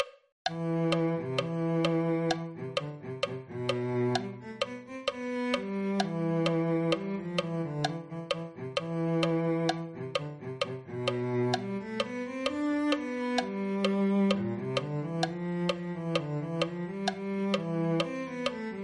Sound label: cello